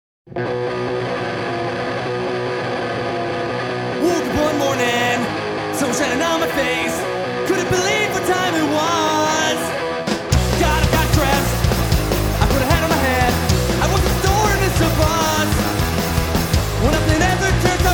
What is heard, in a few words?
Acoustic